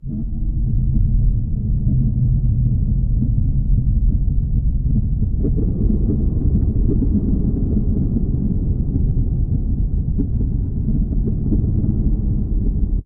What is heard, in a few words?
Scary, nightmares; Dream Zone